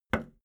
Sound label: video tape player plastic play push